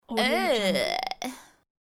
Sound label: female disgusted